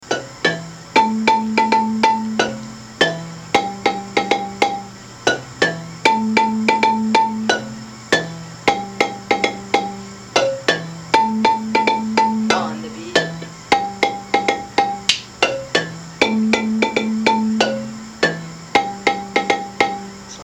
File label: bass first line, marimba; manhanga